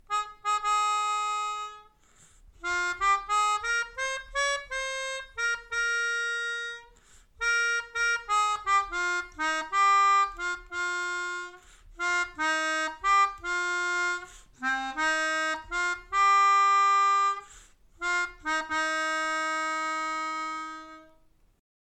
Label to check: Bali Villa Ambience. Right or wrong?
Wrong